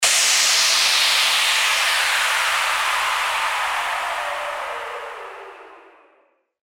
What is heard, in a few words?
WHOOSH